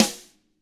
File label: DW RIM1 FD-R, DRUMS SNRD; ILIO; Double Platinum Drums 2